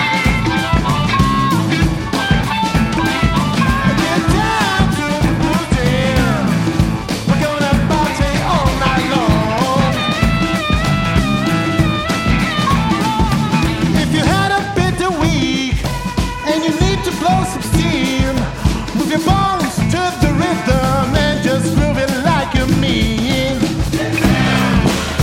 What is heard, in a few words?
Blues Jam